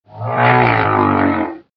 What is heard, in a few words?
big whale